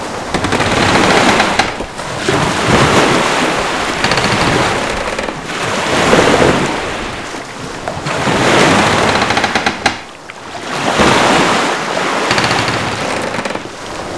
boat waves

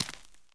walk; dirt foot

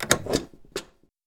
stamp bar open; Papers Please

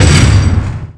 Boss Step Right

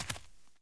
walk, dirt foot

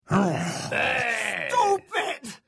boo

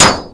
Medium Armor Hit

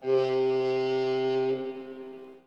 border foghorn; Papers Please

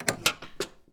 stamp bar close; Papers Please

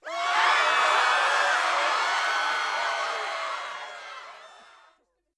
crowd panic; Papers Please

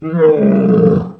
roar; The Elder Scrolls III - Morrowind

